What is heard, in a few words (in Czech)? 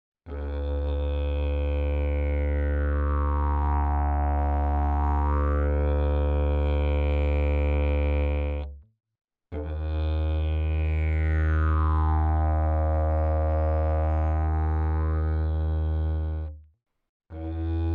cestovni teleskopicke didgeridoo